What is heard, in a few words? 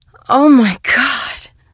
barn explode; Portal 2; Source Engine; video games; outland 07